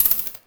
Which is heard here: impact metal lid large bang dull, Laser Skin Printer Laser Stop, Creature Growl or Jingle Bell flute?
Laser Skin Printer Laser Stop